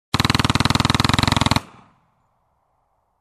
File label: Machine gun shooting near